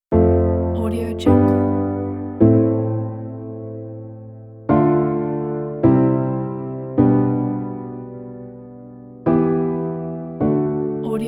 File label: piano sailing away; crazytunes